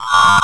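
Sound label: droid probe attack; Star Wars Knights Of The Old Republic I; XBOX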